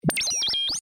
Short Wave Vintage, Scifi, Short, Wave, Vintage, Scifi, Sweep; Science, Fiction; Communication; BlastWave